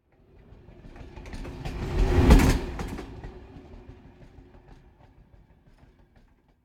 Train Short High Speed Passing European Train Type - L to R; Hollywood Edge; sound designer's toolkit v2